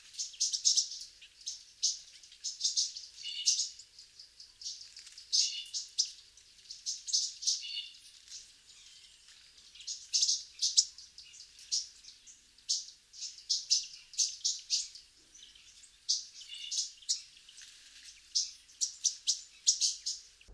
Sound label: Yellow-rumped Warbler Audubon Setophaga auduboni; warblers